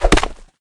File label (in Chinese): chop, 挥动斧头砍树音效; 技能音效 chop; 格雷格; 绿野世界; 卡通农场